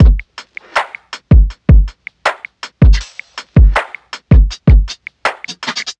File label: 80 Drum Full